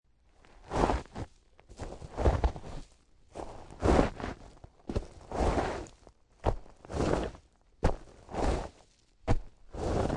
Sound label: Digging Grave, Digging up and filling up grave with dirt, earth; Horror; Lynne Music